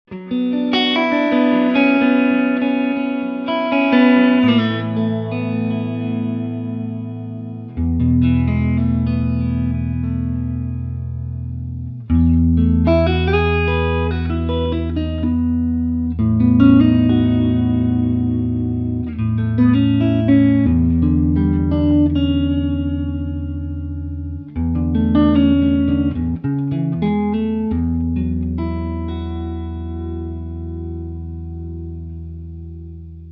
Tele Clean; super 30

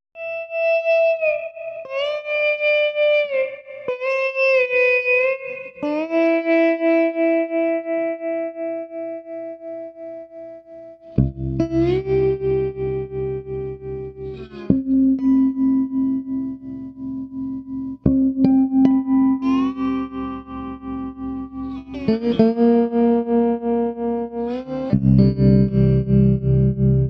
Swart Amp; Verb without tense

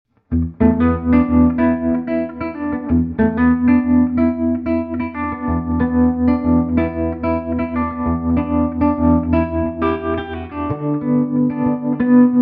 SG Grind Tremolo; super 30

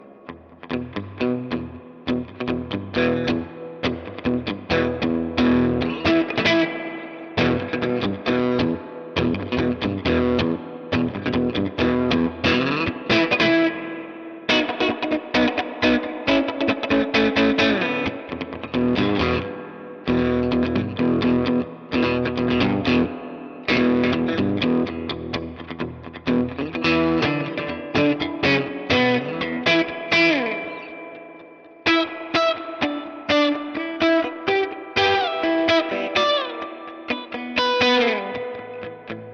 clean big verb, Swart STR-Tweed; Cleanish BIG VERB

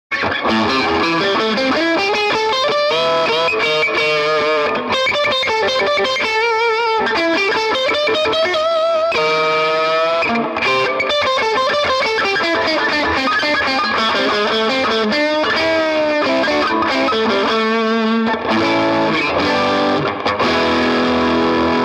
jazzmaster TS808; Swart AST

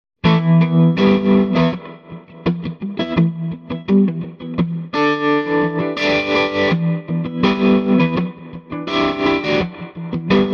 Mando Guitar Trem Grind; Swart 30 EL34; super 30

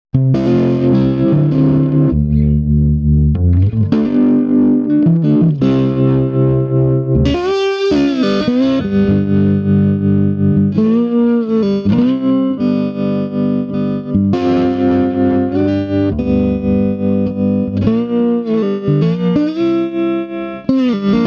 Swart Amp; Full Baked Slide

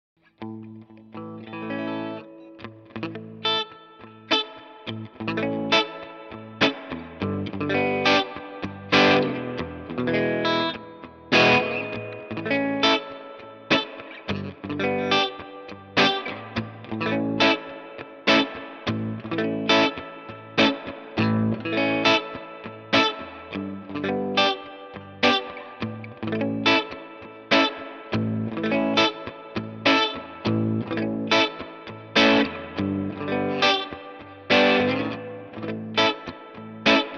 cleanish, Swart STR-Tweed; Cleanish One

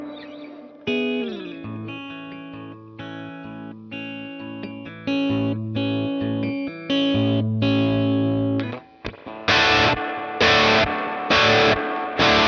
Tweed Dynamics; Tweed Dynamics 1

